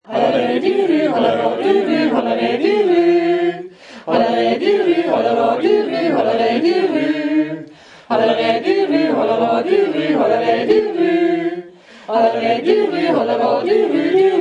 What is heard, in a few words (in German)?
jodeln